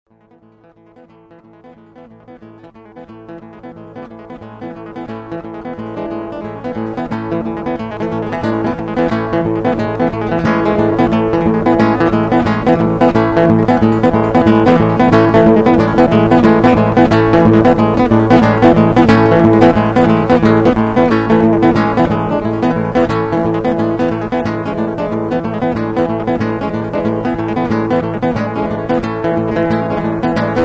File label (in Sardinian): ballu a ghitarra